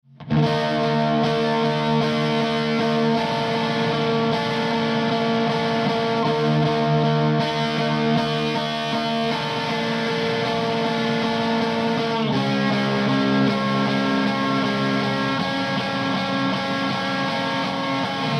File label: Ch2 Max Gain Max Vol, guitar amp